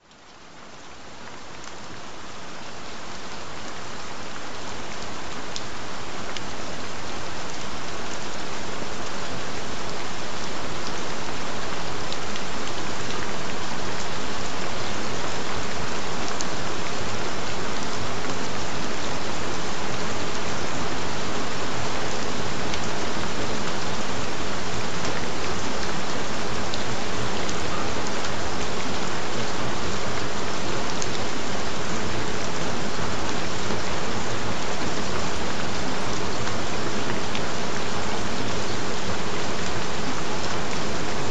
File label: Gentle Rain and Thunder Sounds; Neck Health; Pain and Stiffness Removal